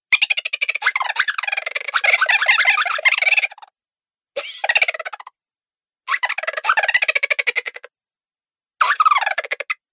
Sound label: Chipmunk Laughter Collection, Cartoon Voice